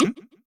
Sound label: miss; HeavenStudioPlus; manzai